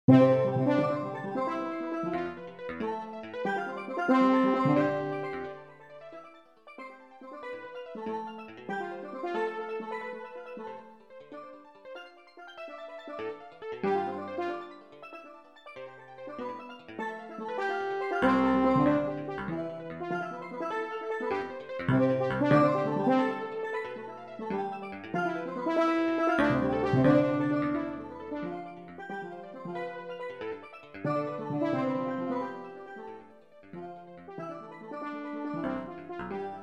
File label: steel drums divisions